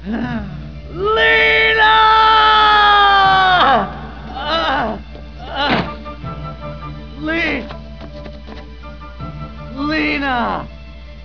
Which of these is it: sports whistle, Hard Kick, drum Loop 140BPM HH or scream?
scream